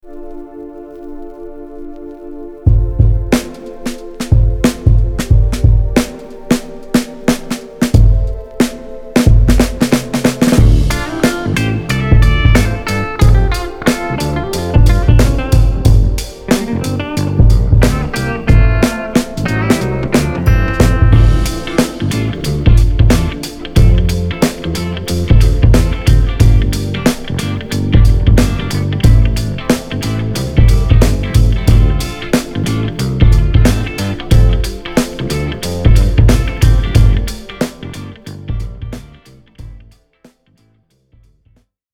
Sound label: Boombap